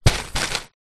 leisure lego box full place down on floor; zapsplat

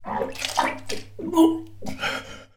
human man vomiting in small bathroom

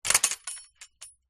household latch hook swing on wall

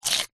human eat bite potato raw single

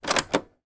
household hi fi system play button press

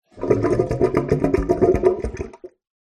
sink plug gurgle from bath draining backwash pipe; household

